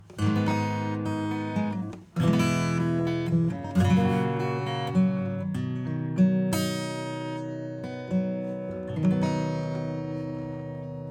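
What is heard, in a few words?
acoustic Guitar; ADC1 Compressor; Sam Pura Guitar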